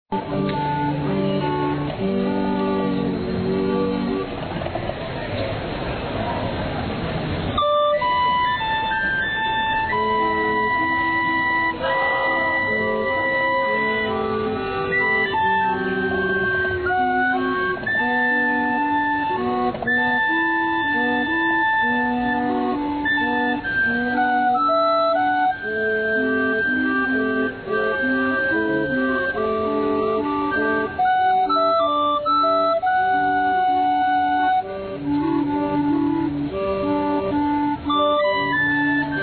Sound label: base loop